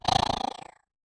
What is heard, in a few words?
spider